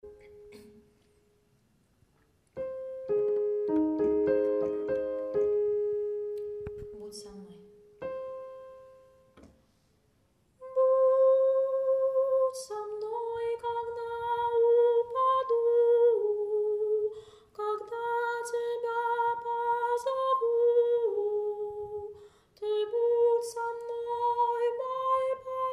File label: soprano; bud so mnoj